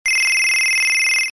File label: Standard Ringtone; meloboom